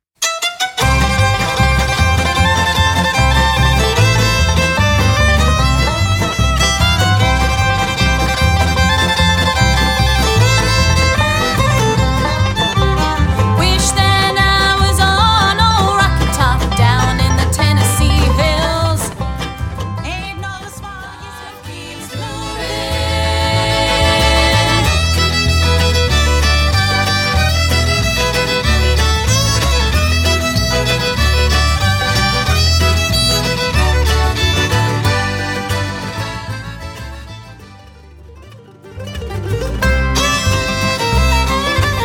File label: bluegrass